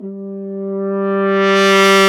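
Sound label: BRS F HRN, French Horn Swell; Roland L-CDX-03 Disk 2; F.Horn FX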